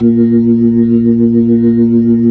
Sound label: B-3, Jazz